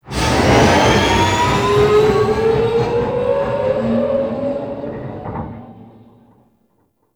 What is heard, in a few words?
Crew Ship Accelerate, Engines; Warframe; RailJack; Grineer Crew Ship